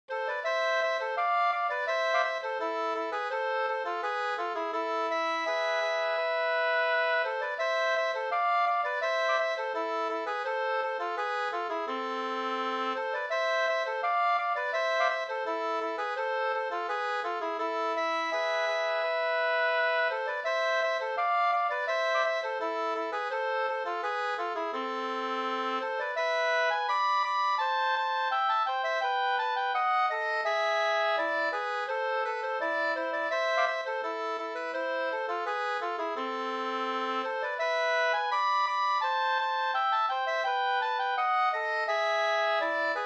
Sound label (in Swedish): gånglåt; fr Enviken e Vilhelm Hedlund nr2